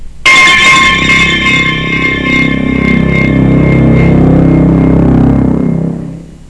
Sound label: key